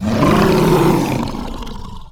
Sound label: Ironhide Runt Play